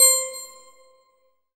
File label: RAVE, RAVESYN